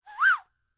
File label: WHISTL; Spectrasonics Vocal Planet; Jazz FX